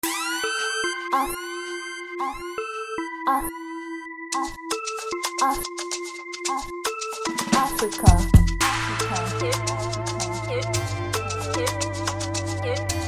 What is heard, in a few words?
Type Beat Freebeat